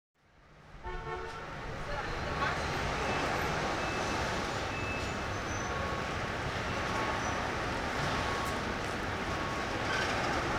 Traffic City

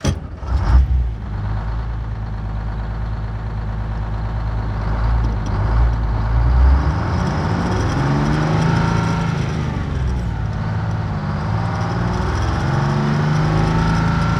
1948 Diesel Truck Int Start Away Stop Idle Off; Period Vehicles

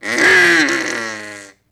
Bronx Cheer; Vintage Cartoon; Comedy Vocals

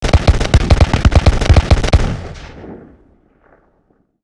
Musket Volley Close; Period Combat

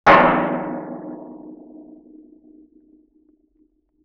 Oil Drum Hit Deep Sustain; Vintage Cartoon; Drums & Percussion

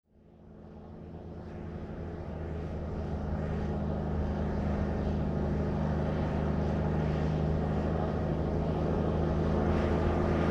1954 Big Rig Ext Slow By; Period Vehicles

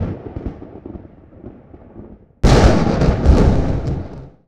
Thunder Claps Huge; Thunder & Lightning

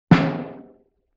Snare Drum Hit; Vintage Cartoon

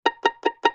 Pizzicato Loop; Vintage Cartoon; Musical Elements

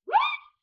Wind Whistle Zip

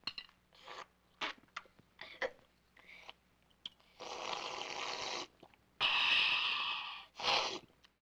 Child Soup Slurp; Vintage Cartoon; Comedy Vocals

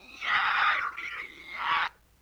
LoFi Synthetic Squawk; Vintage Cartoon; Comedy Vocals

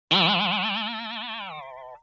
Boing Box

